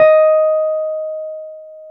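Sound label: Wurlitzer electric piano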